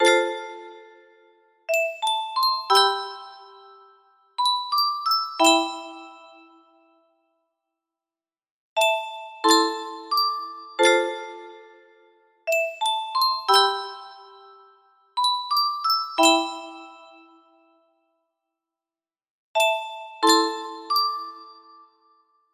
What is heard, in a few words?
piano